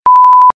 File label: censor beep sound effect